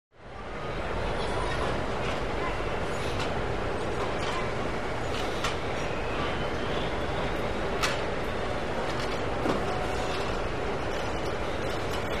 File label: Warehouse Exterior